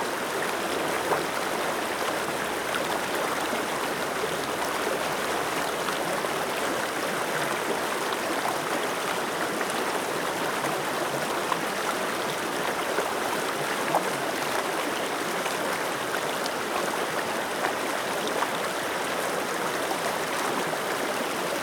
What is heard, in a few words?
mountain stream; firefight